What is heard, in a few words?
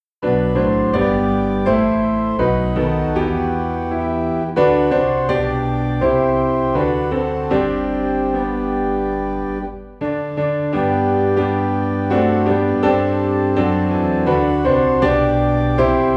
Infant Holy Infant Lowly, Piano and Organ in G; christmas